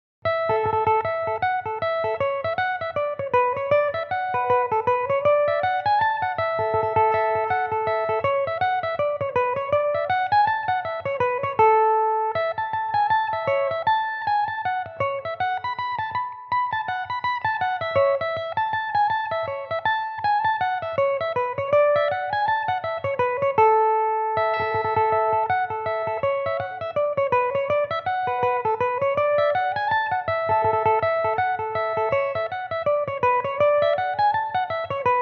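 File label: A major, irish mandolin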